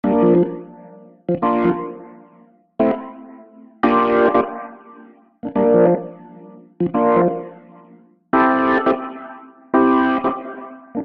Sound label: pitch shifter before